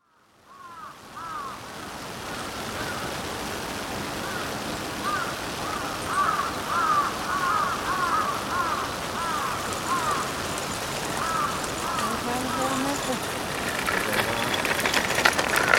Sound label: high volt pachi